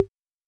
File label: Tom Hi; Hohner Automatic; Drums